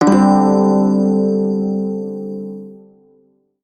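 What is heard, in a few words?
Shawzin Pentatonic Major Chord; Warframe; Prime Shawzin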